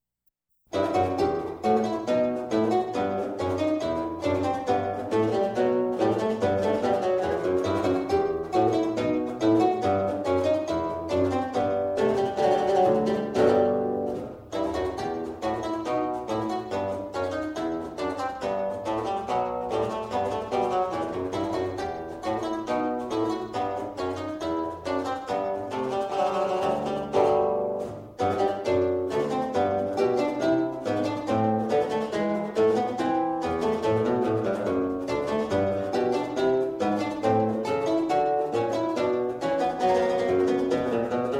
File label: keyboard lute; historical instruments; JS BACH